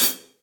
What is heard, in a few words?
Hihat Pedal Room With A Vista; Drum Hits; Standard Hits; Ableton; Factory Packs; Session Drums Studio